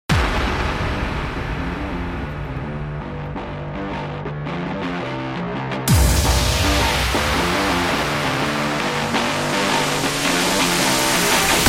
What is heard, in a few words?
Extreme, sports training energy